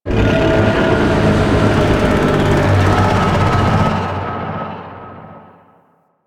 Void Dragon Vocal Idle; Enemies; Vocals

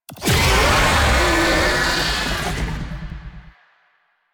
Infested Oni Vocal Player Spotted; Warframe

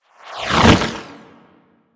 Idle Wing Flap; Dragon Pelt; Warframe

